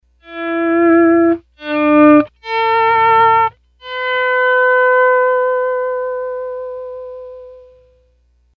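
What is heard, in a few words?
Egtr volped, electric guitar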